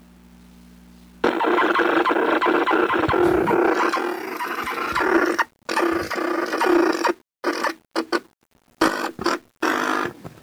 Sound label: amp